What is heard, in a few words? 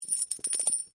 Coin Penny Multiple Fall; Common coins; Money; Objects; SoundEffects Foundation2